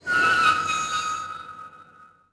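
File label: ambience interior creak; Tony Hawk's Project 8; XBOX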